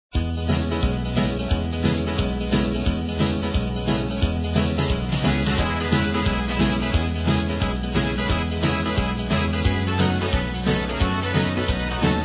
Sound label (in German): klarinetten